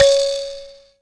THAMB PIANO, AFRICA

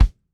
SWUNG KICK; ONE SHOTS; KICKS; breaks; Basement drums vol 2

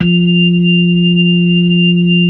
B-3, Hollow; Keyboards of The 60's and 70's

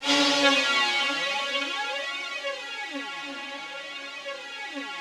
RGHSTR3 C#3, Yamaha VP-1, ROUGHSTRNGS; 90 s Sample CDs; Music Store

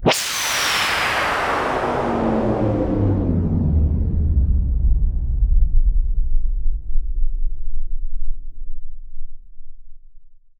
INCOMING; Distored Reality

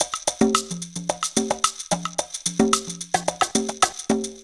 PERC LP, PERC LOOPS; Zero-G; Funky Elements

